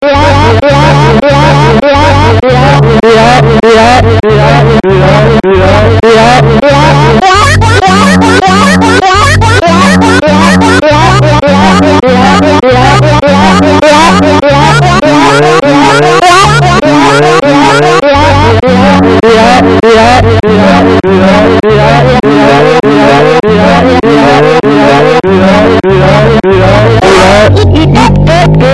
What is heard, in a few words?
Spanish Romance